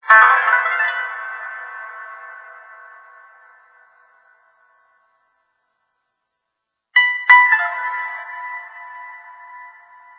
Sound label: Feeling Chillout long Loop